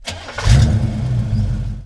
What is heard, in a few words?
pontiac on; Mafie 1